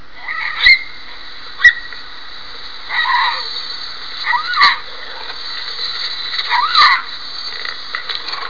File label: cougar squeeks